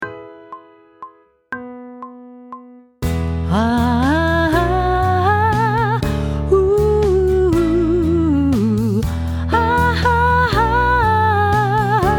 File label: Sight Singing with vocal, pop vocals; Grade 7